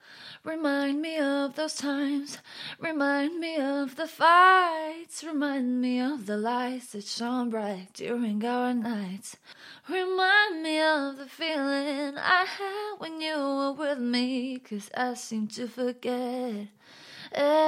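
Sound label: pop rnb female, 50, Eb minor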